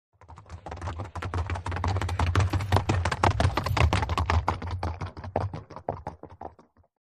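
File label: By 2 Horses Canter Up Past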